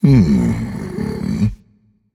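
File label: thinking, nonverbal; smite; khepri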